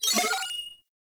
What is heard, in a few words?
Pick Up Life; Warframe; Caliber Chicks Minigame; Pick Ups